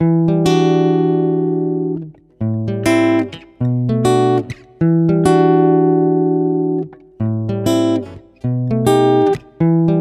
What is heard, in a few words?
Guitar 100 BPM (E9maj); Ghosthack; Guitar Loops & Shots